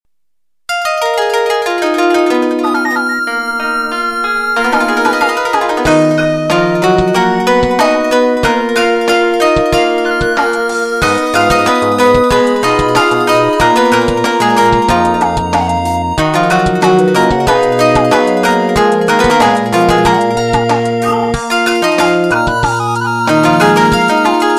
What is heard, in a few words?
sounds melo original, ringtones; elstage demo ringtones